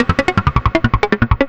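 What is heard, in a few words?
SYNTH LOOP, 160 BPM; Ueberschall; Drum & Bass Flash Trackz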